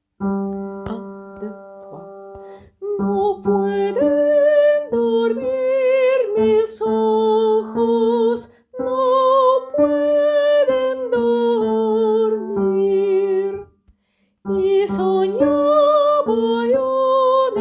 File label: No pueden dormir Tenor